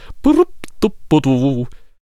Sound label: Gatling Gun Attack Vocals